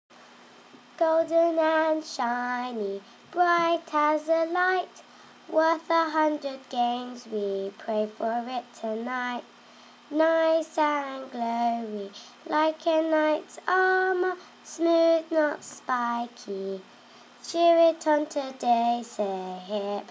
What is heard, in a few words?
World Cup song; Examples of poetry from students that took part in our exhibition activities & workshops